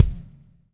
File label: SLICK, Backbeat